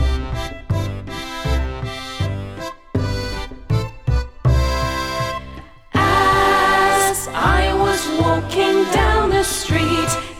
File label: Jig